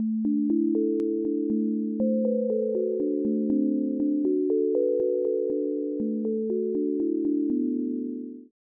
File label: bells